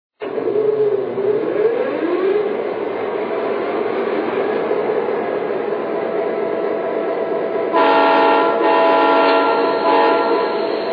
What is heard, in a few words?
MP15DC; NS; G&M